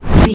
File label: casting charge whoosh buildup